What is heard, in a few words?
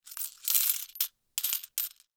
coins in hand